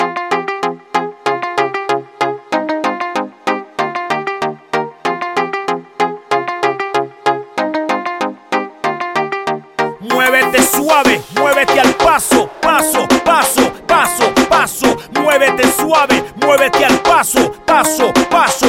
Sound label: Full Loop 95BPM, F